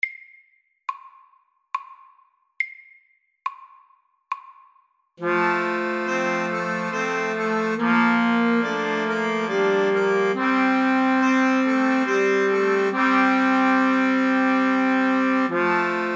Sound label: Musette SLOW